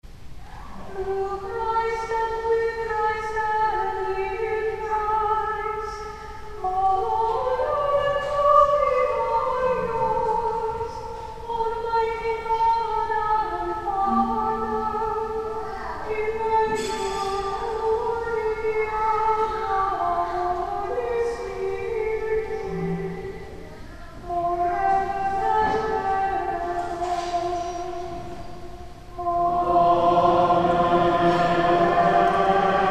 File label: Mozarabic chant; St. John's Cathedral, Jacksonville Florida; Sursum Corda (part 2)